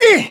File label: kiai; MonsterHunter; Fight